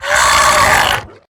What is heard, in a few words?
Web Sling; Lurker; Evolve; Gorgon